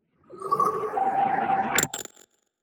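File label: soultrap capture, magic; skyrim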